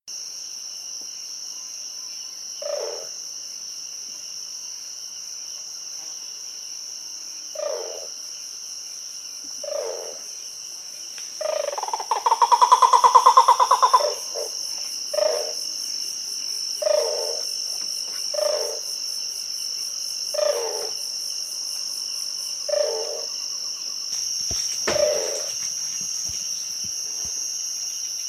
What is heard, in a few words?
great blue turaco; soundscapes